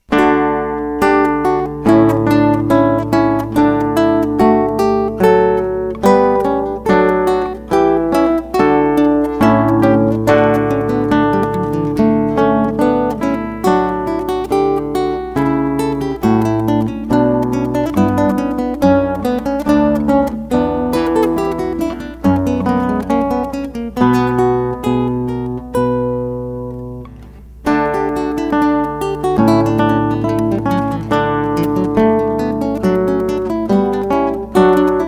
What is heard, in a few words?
Toy for Two Lutes